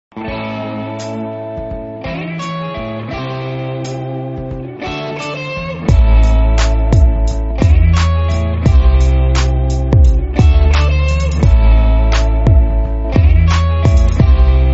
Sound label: Trap Remix; Better Call Saul